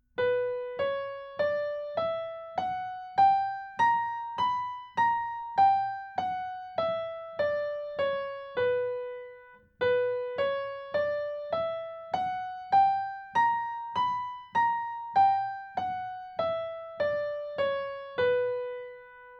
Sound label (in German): Harmonische h-Moll Tonleiter Übung